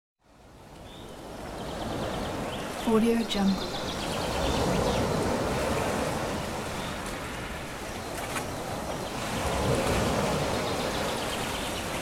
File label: beach waves and birds